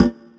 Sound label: EQ Perc, Percussion Mix